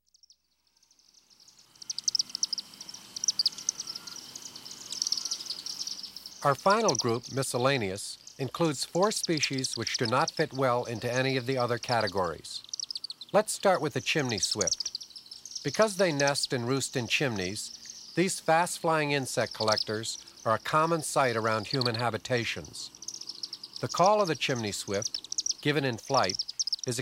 Miscellaneous Vocalizations- Chimney Swift, White-eyed Vireo, Eastern Bluebird, Eastern; Birding By Ear